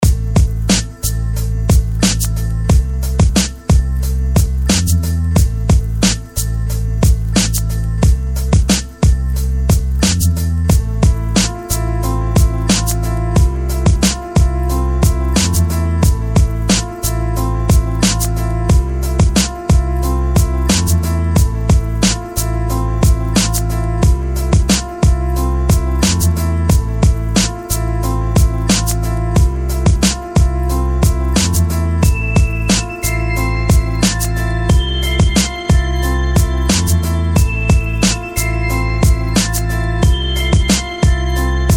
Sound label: Piano; Daidalosone